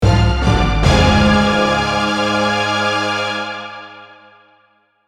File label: Dun dun dun shock transition suspense, cartoon orchestral